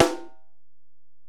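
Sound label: Acoustic Snare, Alesis DM-PRO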